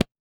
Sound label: THROAT; Spectrasonics; Metamorphosis; THROATERS